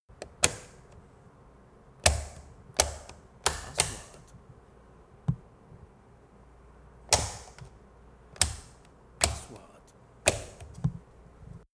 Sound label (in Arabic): صوت صندوق معدات التصوير; sound effect; shooting